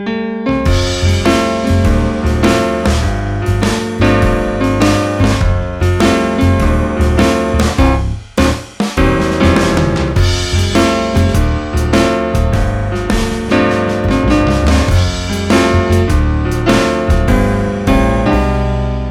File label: Jazzy